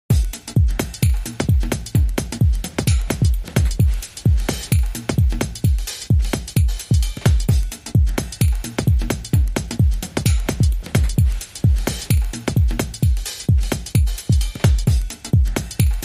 Beats Only

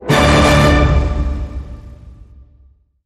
Hit Orch Glam Triple D

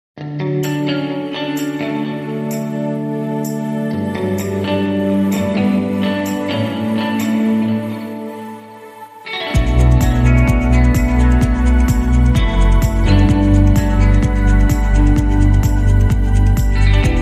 Underscore

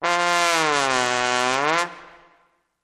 Trombone Slow Gliss Down and Up

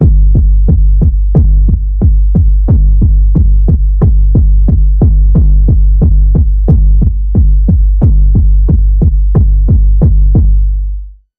Underwater Pulse Wave, G

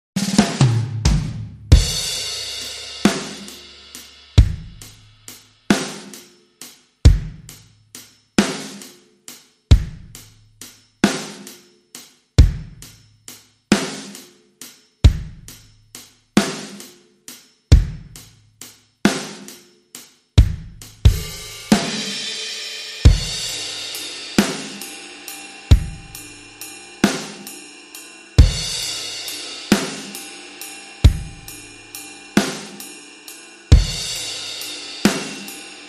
Drums

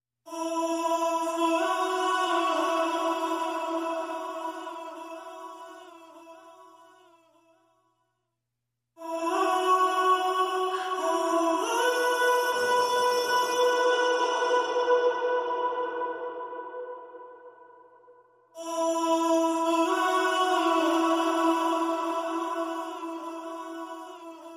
Vocal Only

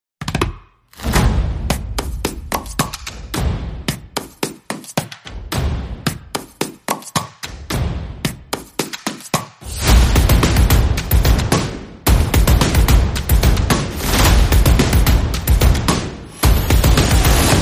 Snap it Clap it Crack it Perc Only

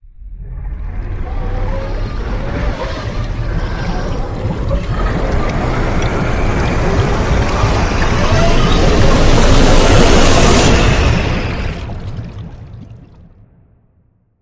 Sea Serpents Attack Rise

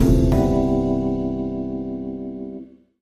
Gentle Chime Chord Transition

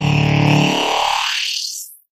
High Energy Sweep

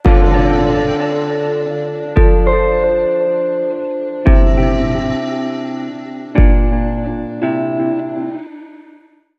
Link Sting

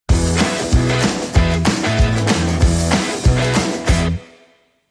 Sting

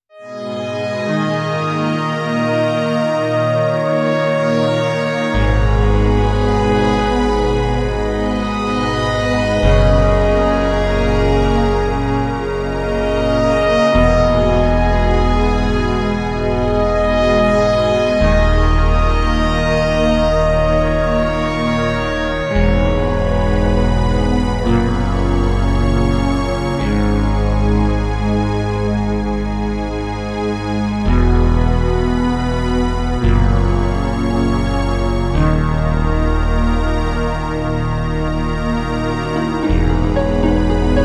Ambient

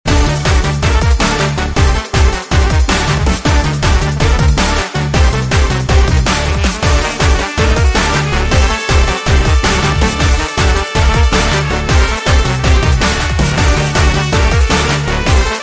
Balkanians (Modern Remix)

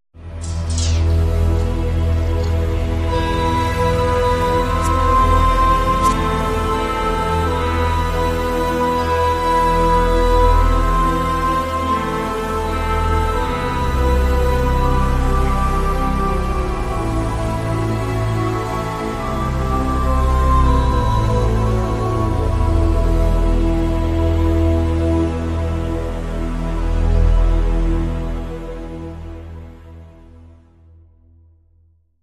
underscore

